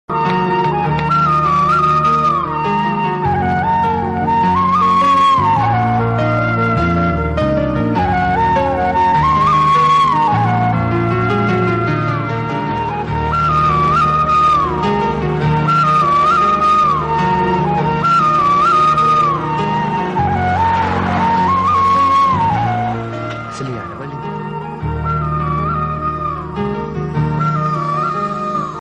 movie emotional bgm telugu heart touching ringtones sad ringtones; uday kiran